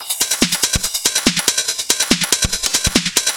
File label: Beat Crush 142bpm, uk garage